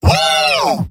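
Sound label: positive vocalization, male; tf2; garrysmod; mvm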